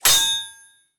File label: sword tsing